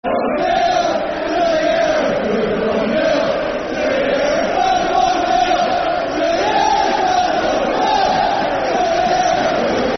One Nil To The Hereford Football Chant (Soccer Songs); Hereford United Hufc